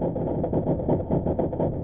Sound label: Windmill; Zelda; OOT